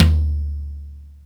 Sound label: acoustic tom drum d key